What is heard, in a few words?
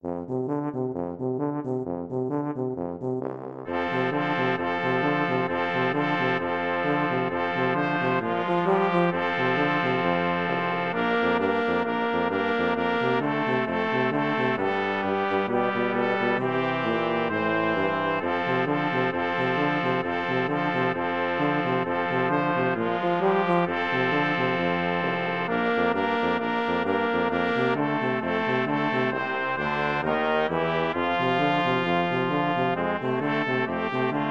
jingle bells boogie brass, flexible mixed ensemble 4 players